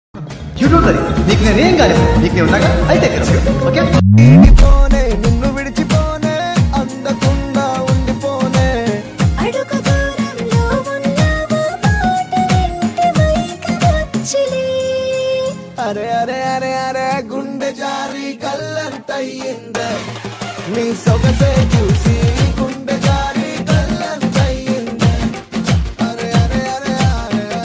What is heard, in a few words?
love song, Telugu